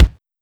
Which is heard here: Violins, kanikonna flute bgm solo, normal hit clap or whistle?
normal hit clap